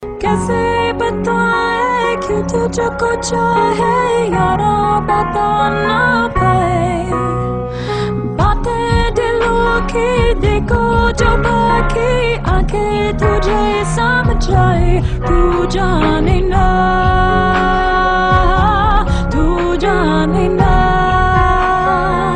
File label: Female